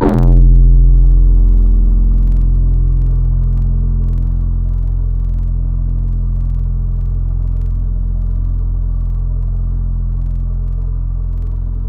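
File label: Tropics lead one shot; Synth One Shots; Synthetic's Serum Collection; Echo Sound Works Core Tables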